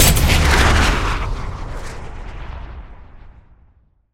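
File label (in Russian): пушечный дальний выстрел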